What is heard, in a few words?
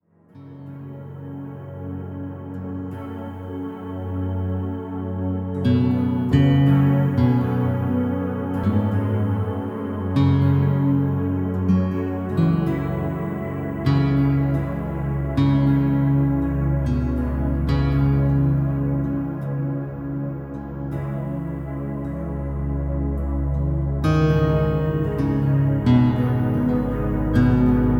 Ambience 432Hz